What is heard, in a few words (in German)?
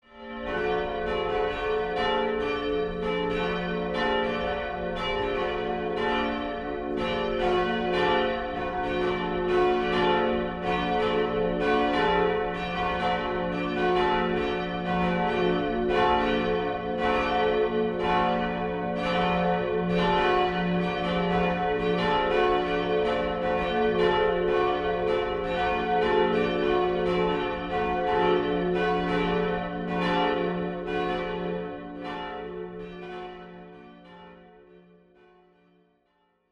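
volles Geläut, Glocken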